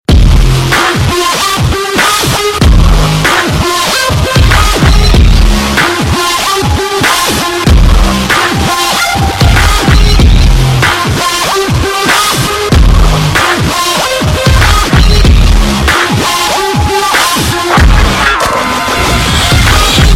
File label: Techno Ringtone